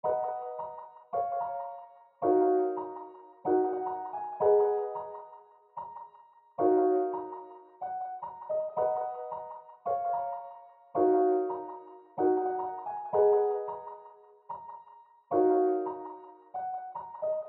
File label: Afro Melody Loop Dmin 110 BPM Piano